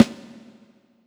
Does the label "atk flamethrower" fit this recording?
No